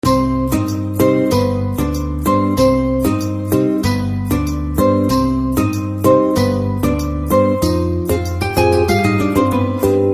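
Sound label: background music Telugu Ringtone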